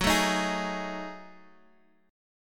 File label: Gb Minor 6th Add 9th, Guitar; strummed; 13,12,15,13,x,x; 4F,4A,5C,5G,5C,6E; JGuitar